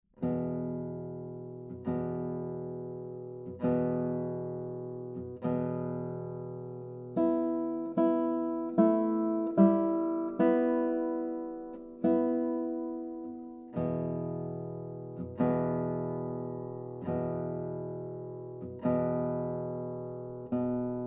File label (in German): Der große Gong